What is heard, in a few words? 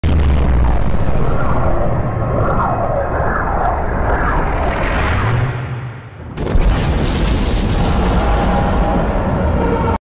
toilet; Mothership; astro toilet